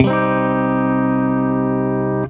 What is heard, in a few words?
C MINOR, Funk Gtr & Wah; PlatinumPhattCD2; E-MU Producer Series Vol. 8 – Platinum Phatt